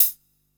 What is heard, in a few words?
Main Room Closed Hat; essential drumkit